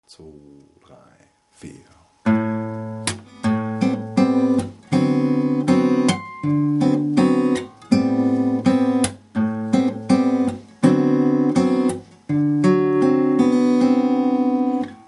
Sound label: bossa groove slow; Latin Gitarre; spielt der rechte Daumen, die restlichen Töne schlagen Zeige-, Mittel- und Ringfinger an; langsam